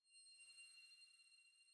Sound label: Env Field Night Ground